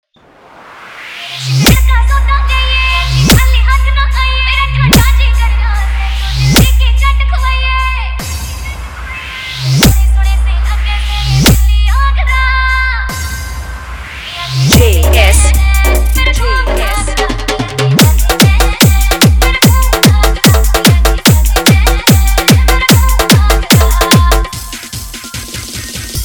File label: Edm Remix Song